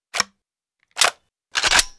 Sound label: fg42 reload; trespasser v2